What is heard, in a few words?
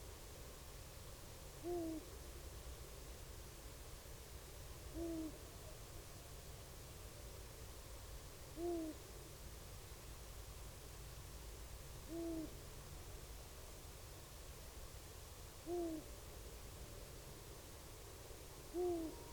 long eared owl sound